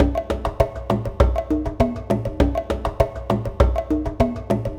Cave Ceremony, percussion; Sonic Foundry (Sony Creative Software); Joe Vitale Latin Percussion

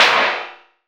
Clap, Gated Reverb; creative gate; musicradar

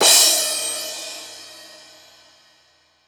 cymbal crash; Voice Crystal - Steve Reid Definitive Percussion

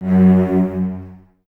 Cellos Detache, String Ensembles; Miroslav Vitous

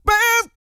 SING, Vocal Planet R&B & Dance; ILIO

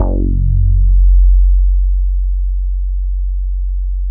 ROLAND JX8P, F#1; Big Fish Audio - First Call Vintage Keyboards

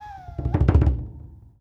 WildFX, African; Quazibeat; African Secret; SFX

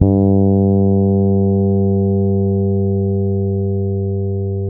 bass, G 3; Spectrasonics; Bass Legends CD 1

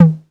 808 TOMS; Electronic; Wizoo - Platinum 24 Electronic

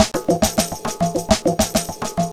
Odd Conga Loop; Drums

